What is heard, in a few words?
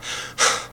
Prisoner Running; Prison Architect